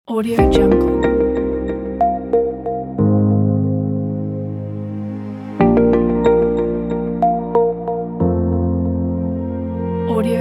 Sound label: bright abstract